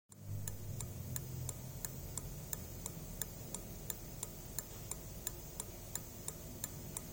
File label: historic tone